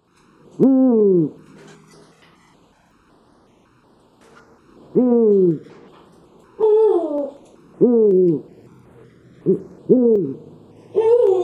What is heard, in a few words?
bubo